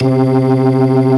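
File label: B3 low distortion, ToneWheel Organ; E-MU Formula 4000 Series Vol. 3 – Analog Odyssey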